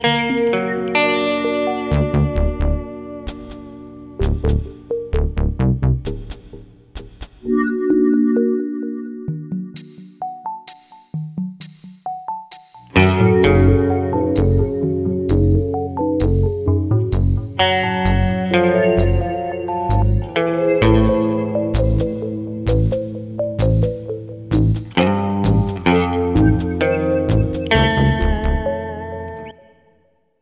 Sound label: Music Downbeat Plants